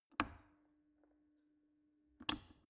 plastic water boiler turn on off soft

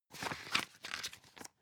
magazine open

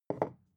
bottle put down light

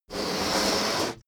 balloon blow

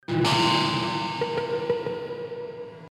hit guitar ambient

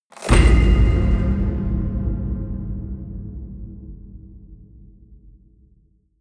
metal crash hollow ring out small

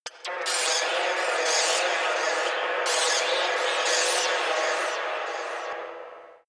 sci-fi machinery

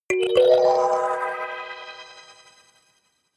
notification kalimba magical fourth up

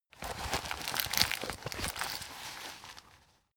plastic bag handle aggressive

